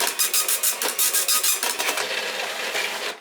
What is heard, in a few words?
printer print